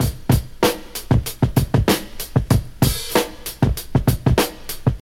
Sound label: 96 bpm breakbeat c key, Free drum groove - kick tuned to the C note